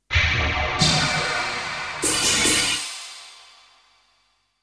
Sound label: big crash